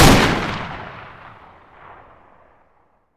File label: rem870 fire, shotgun; weapons; garrysmod